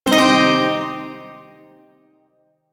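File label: Shawzin Pentatonic Minor Chord; Warframe; Duviri Shawzin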